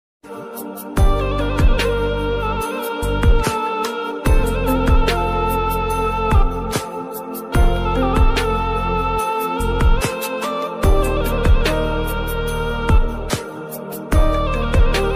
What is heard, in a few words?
Turkish sad ringtone; Kurulus Osman